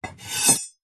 warfare knife metal blade edge scrape wood

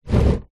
plastic tub slide on top of another short; foley

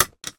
van seatbelt click lock; transportation; sound effects; sound effects one

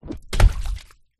leisure rubber ring inflated drop into water

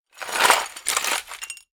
foley broken glass sweep up dustpan plastic; zapsplat

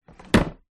office plastic paper drawer tray slide close

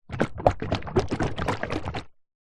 industrial engine oil large tub shake; zapsplat

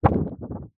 foley paper laminated sheet single short shake

